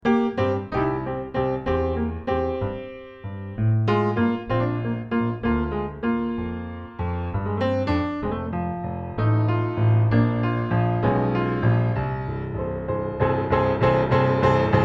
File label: mississippi blues